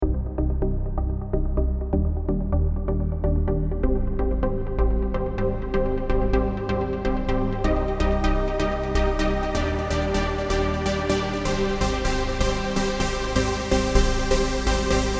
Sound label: new trance lead